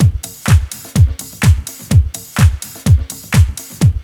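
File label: drum loop; X-Static Goldmine 3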